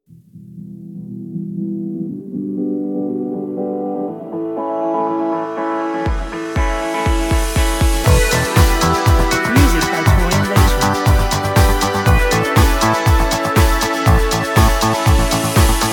Sunscreen stinger

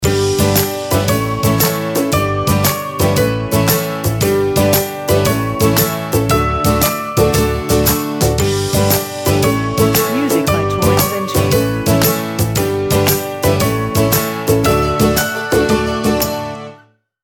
Swing and Smile stinger

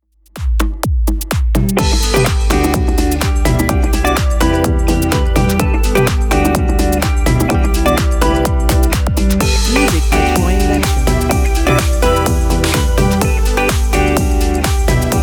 Summer Radio Pop

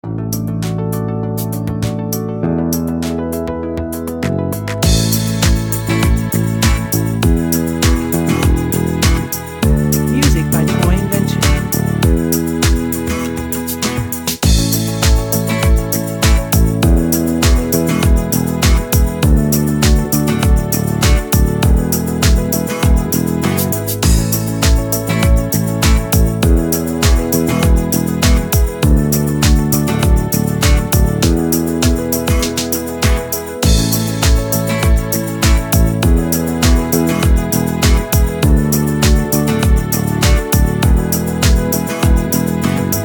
A Feel of Spring background

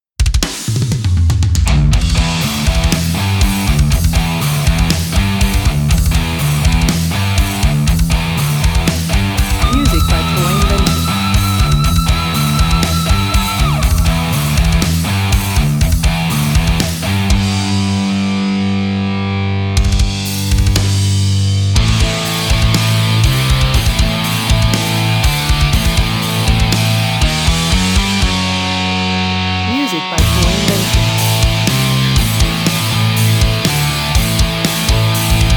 Screaming Guitars

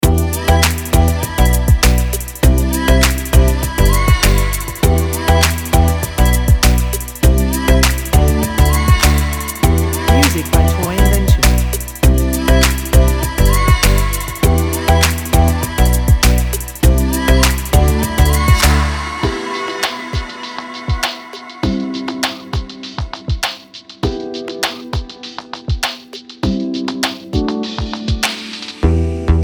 Breezy Summer loop

